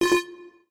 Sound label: button